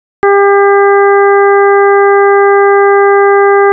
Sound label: composite 400Hz harmonics with silence; KSP-440; KSP-400 次期モデル・オープン開発